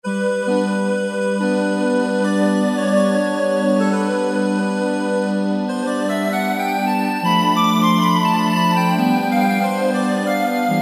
Syn-Harmonium; Factory preset demos

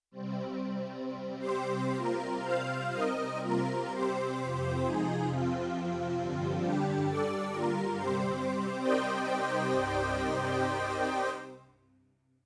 Breath, Synth Set, Yamaha TX16W

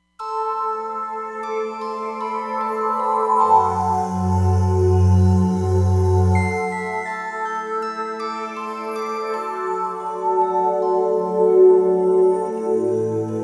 Evocative Pad, Roland Fantom XR

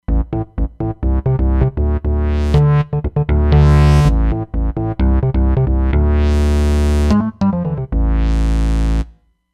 Power Up Bass, Roland Fantom XR

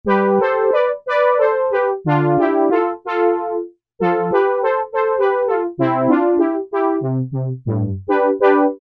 Soft Brass, Korg MS2000; Factory Patches

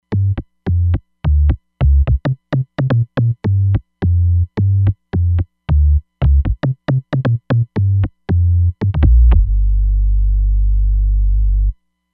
Poly Bass; Roland Fantom XR

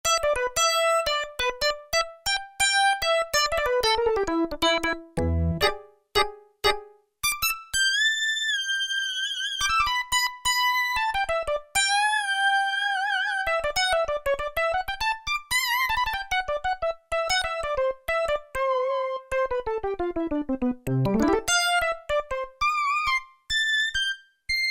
VZ SOLO LEAD, Casio VZ-10M; Internal factory combi

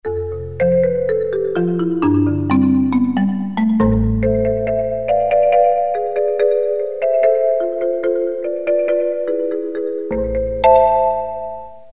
Vibes

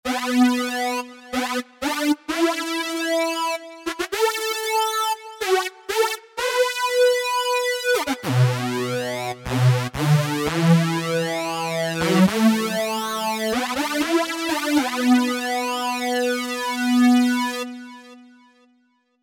SYNTH TEXTURES - Brass; Ornos Brass; Apple GarageBand 2

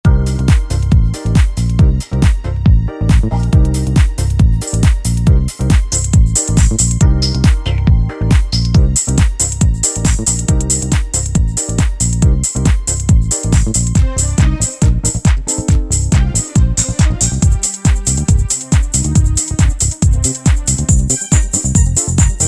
Discomania; Yamaha DJX-IIB

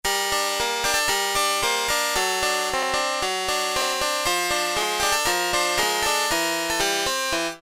Lite Harpsi, Yamaha TX81Z

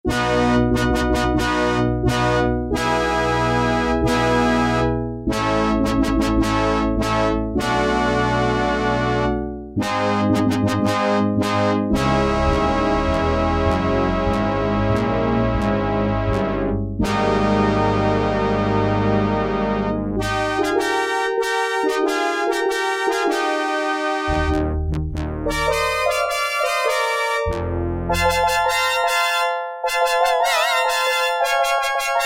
ORCHESTRA, Yamaha DX7

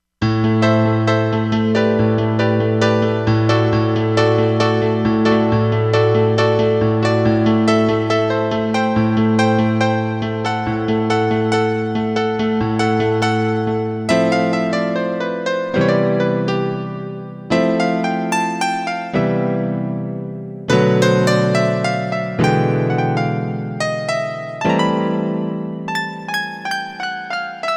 Piano; Korg MSC-16S Environment

